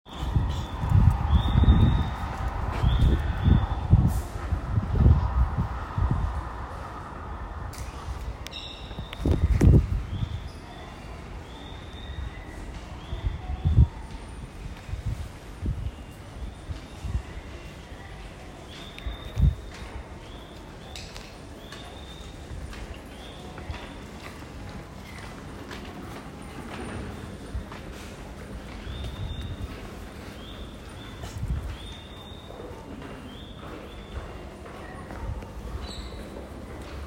京王線仙川東接近 and ambience, train sounds

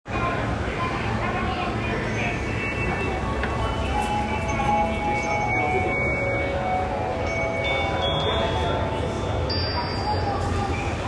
線調布東接近, train sounds; 京王

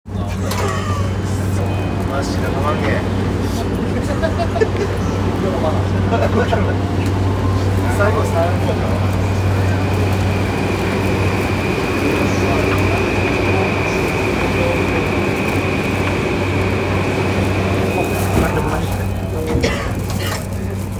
JR 山手線 鶯谷

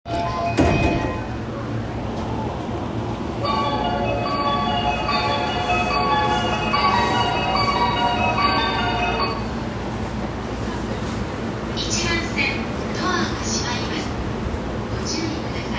JR横浜線相模原北, train sounds

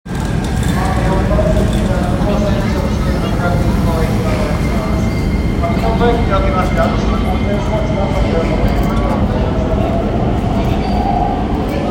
京急空港線京急蒲田南接近, train sounds

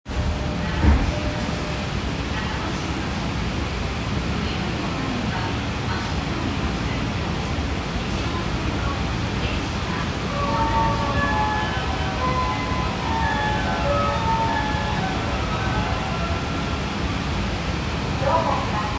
丸ノ内線中野新橋西

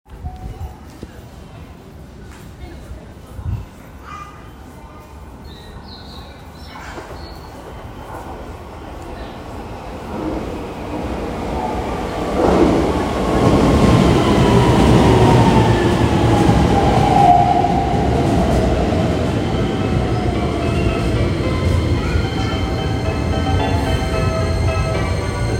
京急本線立会川北接近

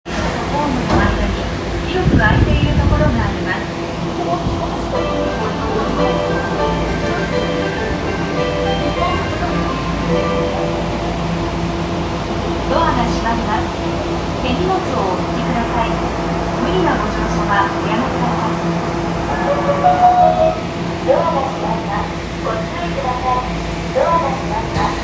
銀座線赤坂見附西, train sounds

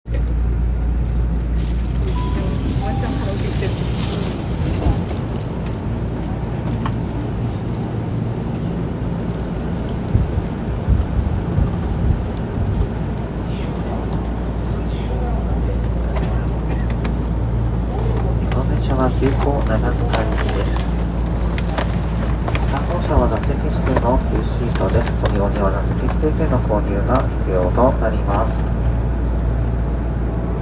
東急大井町線自由が丘西, train sounds

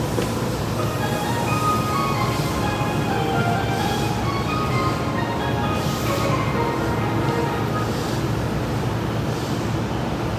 京葉線千葉みなと東, train sounds

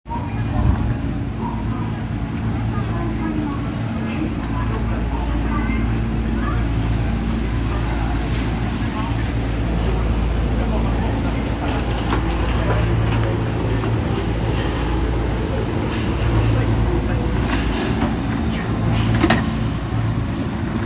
丸ノ内線東京西

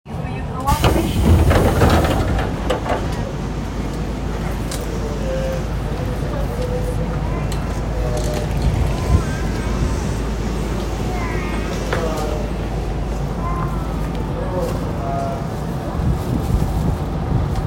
京急空港線穴守稲荷東, train sounds

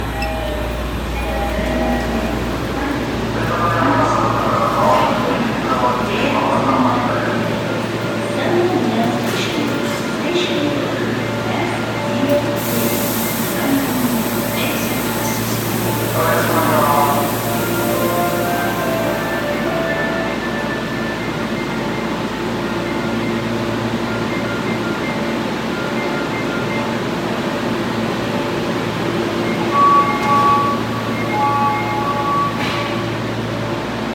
神戸市営地下鉄西神・山手線三宮発車音

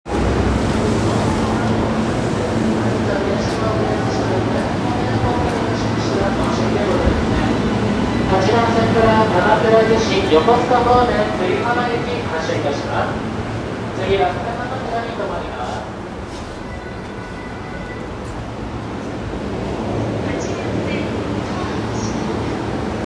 JR横須賀線大船南, train sounds

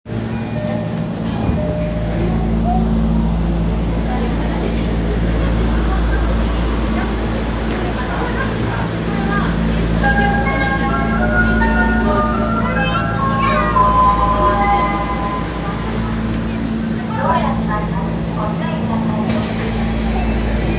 丸ノ内線新宿御苑前東

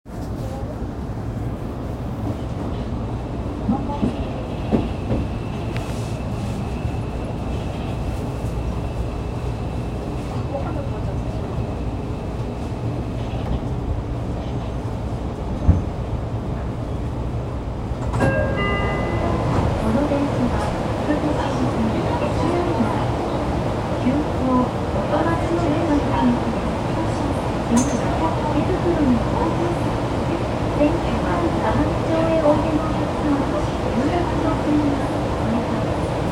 副都心線小竹向原南

